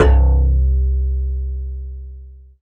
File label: BASS MONO